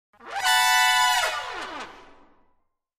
Horn Section Slide, brass trumpet

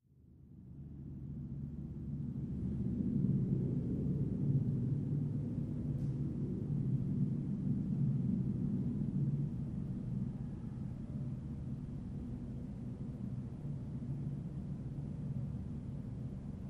Tunnel Amb W Low Drone; tunnels

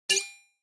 Flash Beep HF Metalli

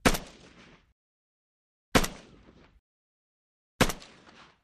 AK47 Single Shots Ste

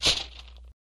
Page Turn Standard; paper mvmt

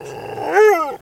Dog Wanting Growl

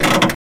Car Frame Groan Rattl

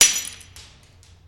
Light Bulb Break; lightbulbs

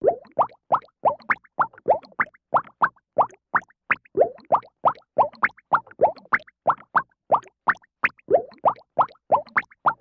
Funny Bubble Rando; bubbles

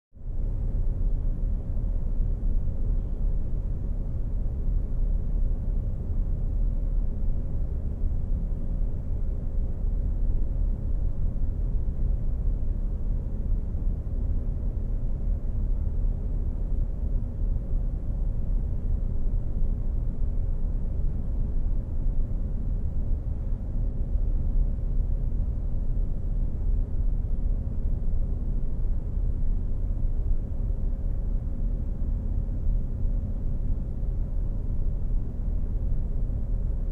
Engine Rm Generator, Engine Room

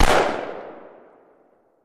Gun Shot Sngl Fire

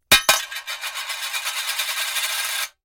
Coin Drop Spins Coin D, coins

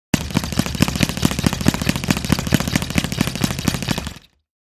Speed Bag Speed Bag Hi

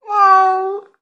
Cat Domestic Meow Sad

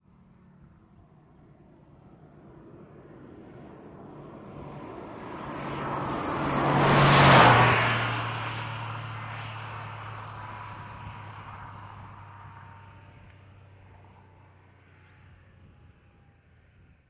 Car Bys Fast Singles

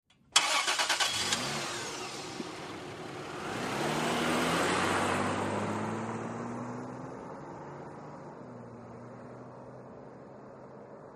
SUV Engine Accele

